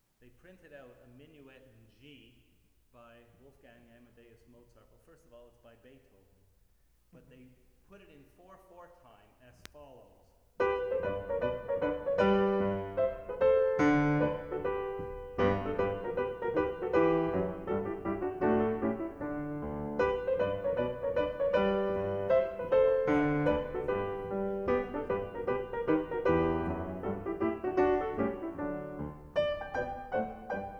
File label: Minuet for piano in G major; Stereophile Test CD, Vol 2